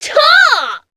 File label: succubus shout long; queen girl